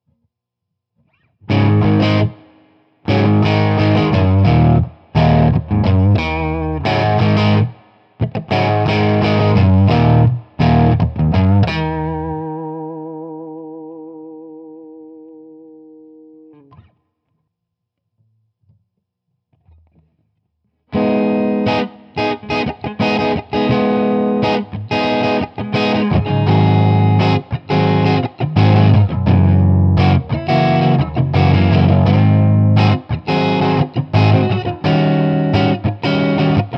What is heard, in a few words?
DSL OT Clean, Marshall; Marshall DSL Transformers